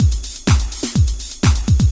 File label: DRUMLOOP HOUSE 125; eJay